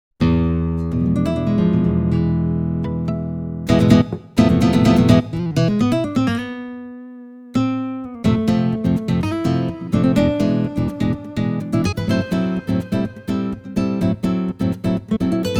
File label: Nylon Guitar